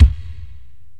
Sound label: BD, Dance Mega Drums, HIP